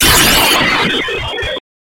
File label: Gamelan (Java) - Sunda Country - Art Music